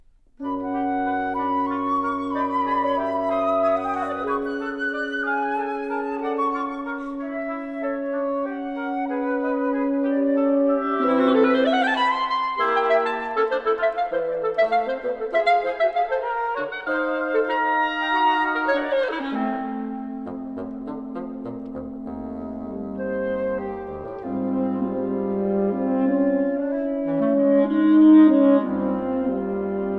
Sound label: Woodwind Quintet - Allegro